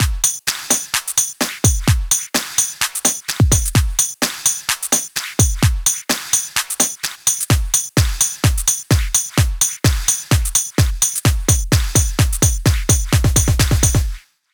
Tension Buildup Drums - 128 BPM, House; Loops; Cymatics; House Starter Pack

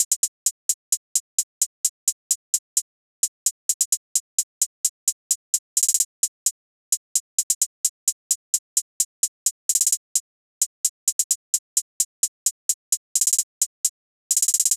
Drum Loop 130 BPM Hihat, Trap; Cymatics; ANALOG EVOLUTION Beta

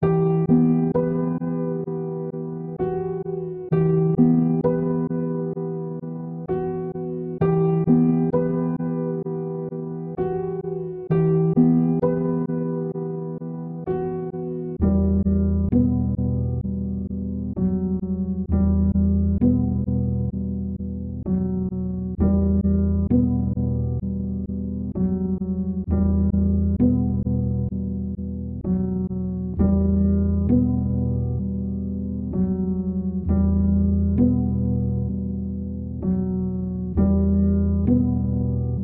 130 BPM D# Min Piano, Loop Stems; Melodies; Cymatics; 2022 Melody Mystery Expansion; Good And Evil

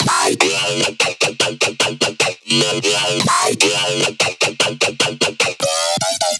Bass Loop F 150BPM, Dubstep; Bass Loops; Synths; Cymatics; Dubstep Starter Pack

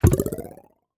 Material Liquid Bubble Pop UI; User Interface

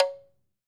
Krin - C#; percussion; Cymatics - Infinity Beta Pack 2.0; Zeus Percussion Collection

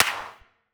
Snare, Dubstep Snares; GhostHack

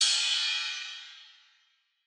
Ride; Cymatics; Eternity Sample Pack; Drum One Shots; Cymbals

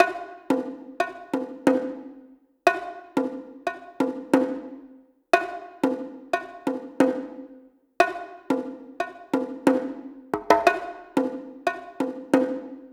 Tablas, 90BPM, Percussion; Percussion Kits; STEMS; Ghosthack; SkyRealm; Kit 04 - Right Of Passage

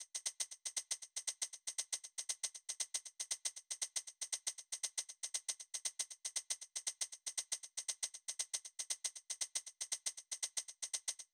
Drum Loop - 118 BPM Shaker; Loop Stems; Cymatics; Pacha Drum Loop

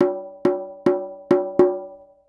Perc roll 105, Afrobeat; Afro Drums; Fills & Rolls; GhostHack; Drum Mega Bundle

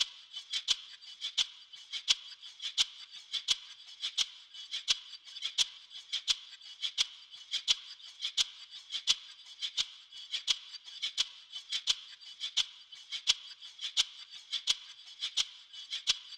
Drum Loop - 86 BPM Shaker; Loop Stems; Cymatics; DOPE DRUMS VOL. 1; Bunny Drum Loop

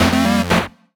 Retro FX; Cymatics; Video Game Sample Pack Vol 1

